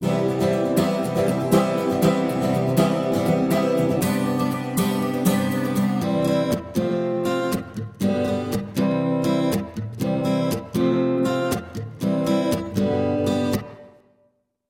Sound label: Spring reverb